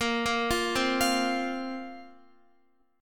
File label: A sharp Suspended 2nd down Guitar; A#sus2 arpeggiated